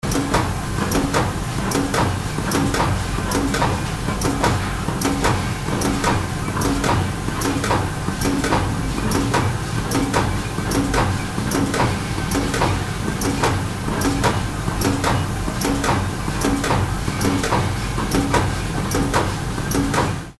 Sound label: packing machine